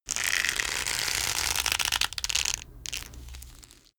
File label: leather creak